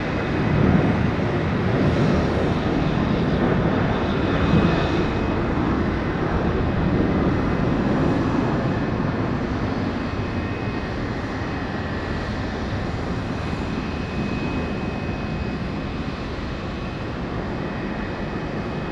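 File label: LANDING FIELD, AIRPORT; AKAI S-Series CD-ROM Sound Library VOL-6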